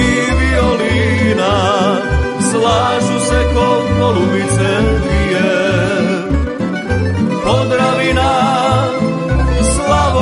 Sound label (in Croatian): TAMBURA I VIOLINA